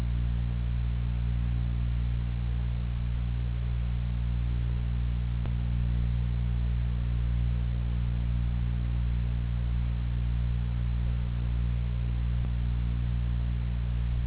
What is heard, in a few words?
fan; cstrike; moon